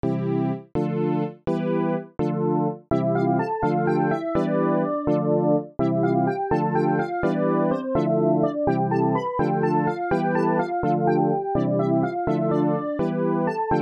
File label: organ melody